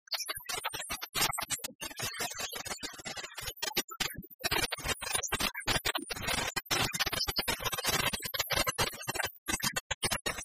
uplifting ringtones

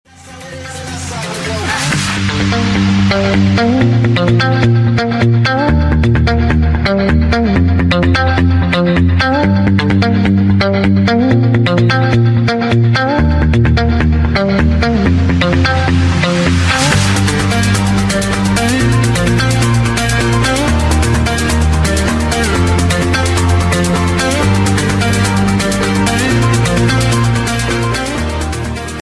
guitar track ringtone

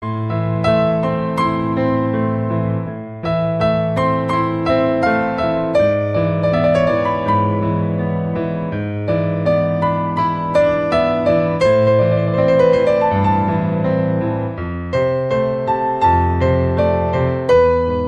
piano sad ringtone

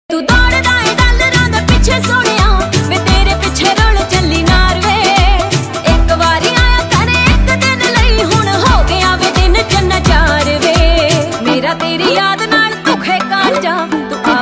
punjabi ringtone

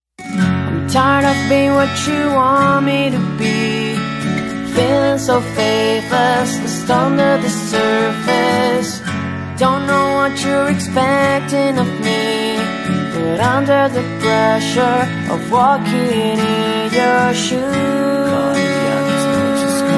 accustic ringtone

